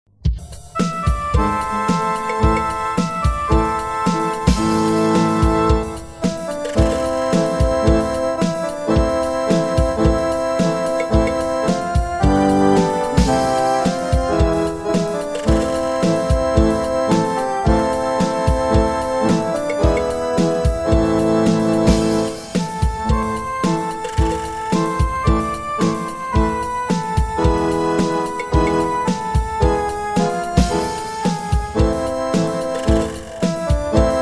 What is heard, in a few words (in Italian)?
solo musica